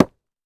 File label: Industry Tool Sledge Hammer Hit On Log